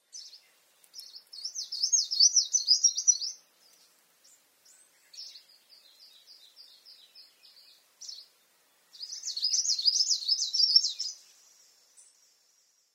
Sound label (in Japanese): yanagimushikuis, sakata